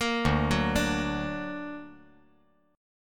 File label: D Augmented, chord